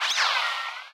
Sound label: Cartoon Dash Dodge Type